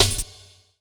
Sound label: Rev Snare, Hot Pantz Rev Snares; Zero-G Jungle Warfare 1, 2 & 3; Percussion Hits; Drum Loop Hits